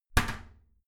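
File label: toilet lid slam regular, bathroom; haus-garten; badezimmer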